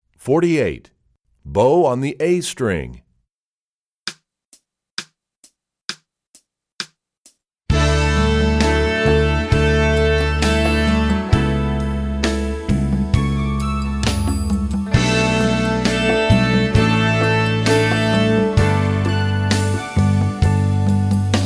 Bow On The A String, violin

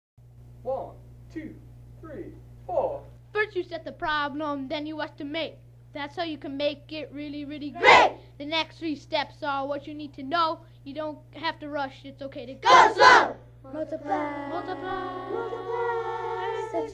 Long Division Rap

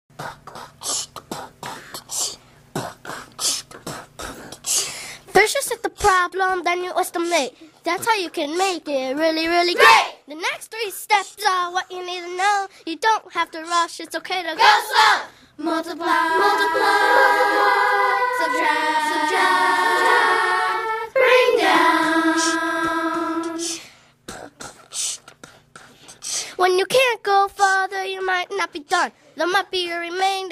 Long Division Rap

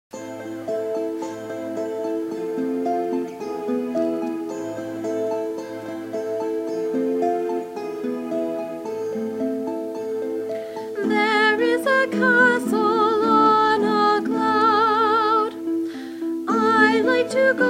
Vocals

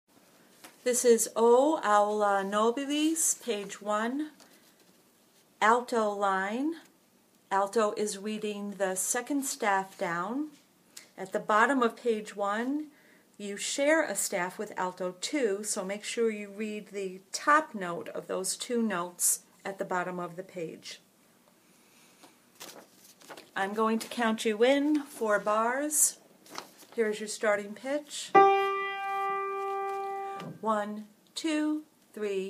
Alto, Special Chorus

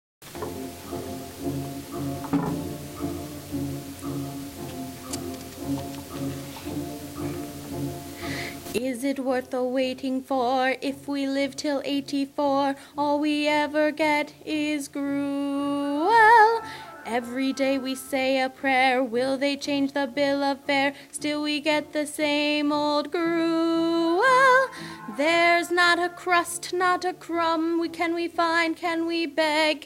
Vocals

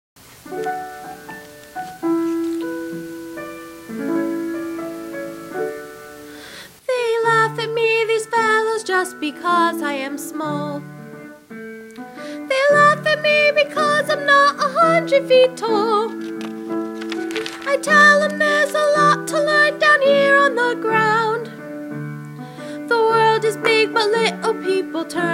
Little People Vocals

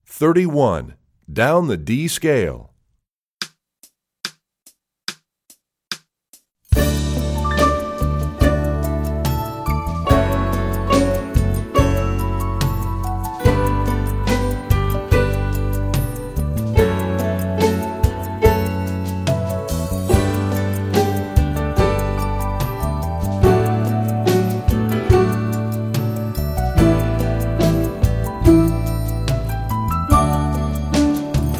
Down The D Scale, violin

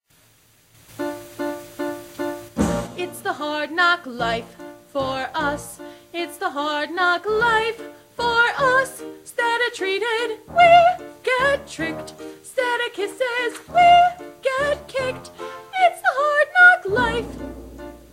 Vocals Lower

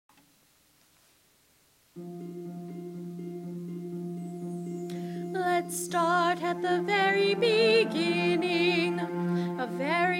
Vocals

